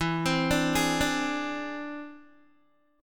E Augmented 7th E down Guitar; E+7; arpeggiated

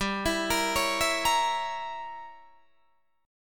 G Diminished 7th, Guitar, Open D

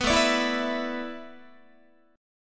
A Suspended 2nd Suspended 4th, Guitar; Asus2sus4 strummed

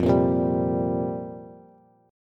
F Suspended 2nd Suspended 4th F down Bass; strummed; JGuitar

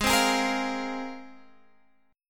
C 7th Suspended 4th Sharp 5th, G sharp

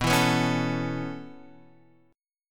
B 9th Suspended 4th B down Guitar Open F; B9sus4 strummed; chord shape; JGuitar

